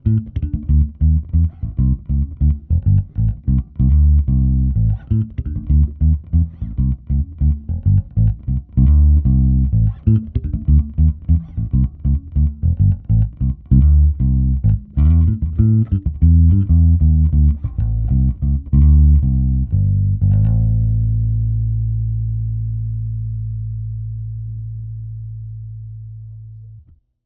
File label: EL BASS FET